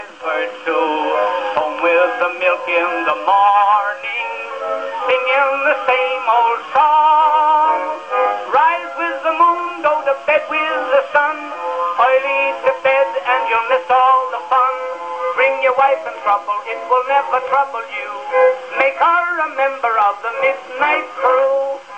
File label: vocals; FL Studio